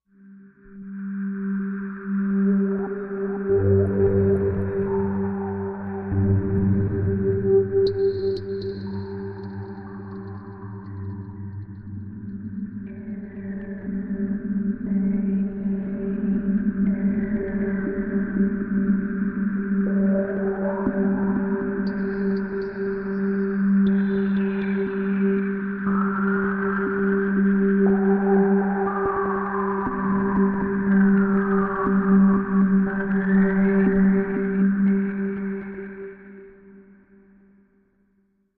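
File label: Low Space Tone, sci fi ambience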